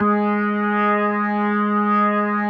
G#3S, B3, Vintage Keyboards

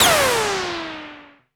Axxe, ARP Axxe

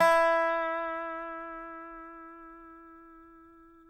DOBSUSE4 -R, dobro notes; Country

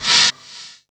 RISK FX; USB Soundscan vol.24; AKAI; Industrial Loops

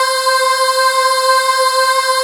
voices C4

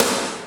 SNR, snares; Roland - Rhythm Section; Snares 1; Sn Modules 1

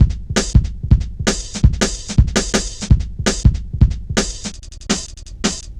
Drum Loops, Jungle

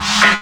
PERC, Drum & Bass, 130 BPM; Ueberschall; Drum & Bass Flash Trackz

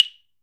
WOOD BLOCK; ILIO; Synclavier Percussion 2; World & Orchestral

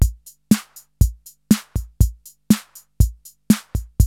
CR-8000 LOOP, DRUM LOOPS

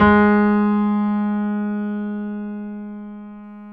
BOSENDORF

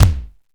bass, AKAI XE-8; Greytsounds

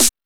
Techno C; EMU XL-7

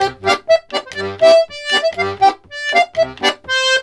120 POLK, Accordions; USB Soundscan vol.40; AKAI

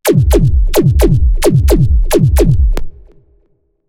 Phase, bass

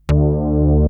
Synth hits and riffs

